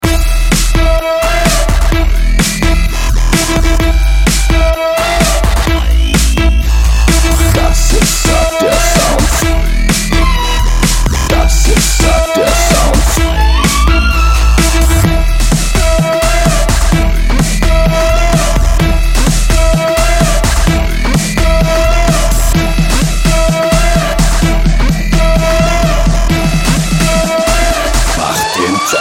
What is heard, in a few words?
android techno, ringtones